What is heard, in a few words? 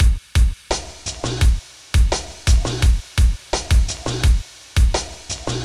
Slooow Beat